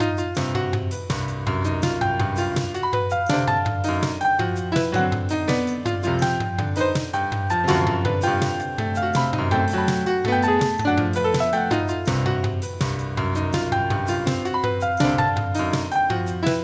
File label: avant-garde music bed